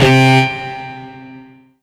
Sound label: Rock Guitar C3; Korg N1R